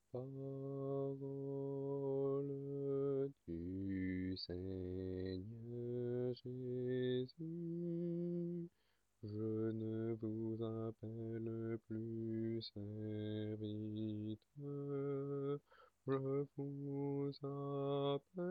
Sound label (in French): Parole du Seigneur Jesus basse